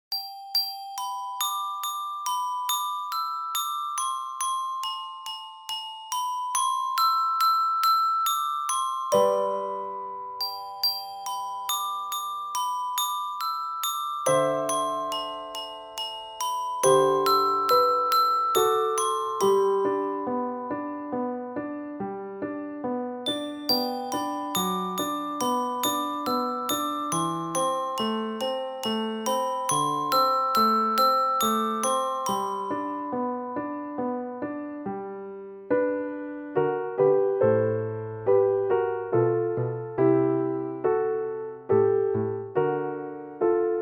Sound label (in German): Glockenspiel Klangbild Kind; Akte Zwingli; Fassung Zürich